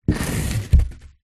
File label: foley knife serrated cut polystyrene